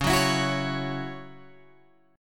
Db Minor Double Flat 5th Db down Guitar Open G

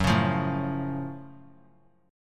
F sharp Minor Major 7th; strummed

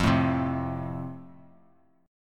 F Major, F Chord; strummed

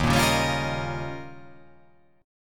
D sharp 9th D sharp

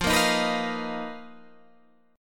B Major 7th Flat 5th F, chord shape

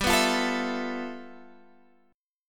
G 13th G Guitar Drop D, chord

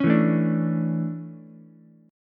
D Major 7th Suspended 2nd, Ukulele; DM7sus2; strummed; JGuitar

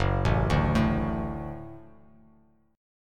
F sharp Minor Sharp 5th F sharp Bass Standard; arpeggiated; JGuitar

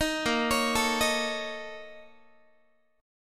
A sharp Minor Major 7th Double Flat 5th, Guitar; 18,18,16,19,19,x; 4A,4E,5A,5D,5G#,6C#; arpeggiated

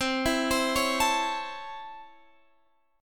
C sharp Minor Major 7th Sharp 5th C

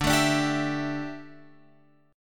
D Suspended 2nd Suspended 4th

